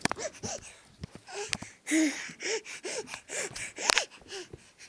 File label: gasping